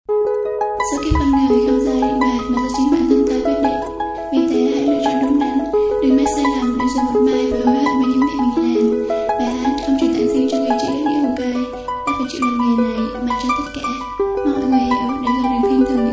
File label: rap; viet rap